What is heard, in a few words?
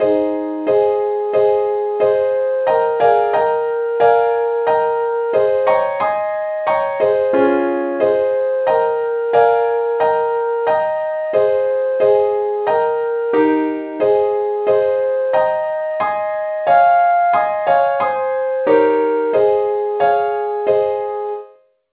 leadsheet key aes; SAINTTHOMAS